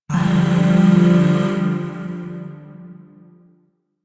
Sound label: Shawzin Maj Chord E; Music; Chords; Warframe; Zariman; Void